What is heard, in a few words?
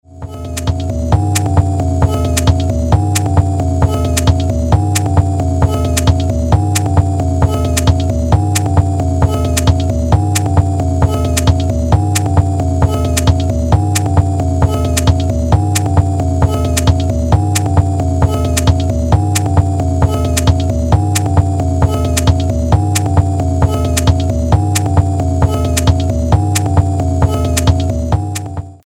Loop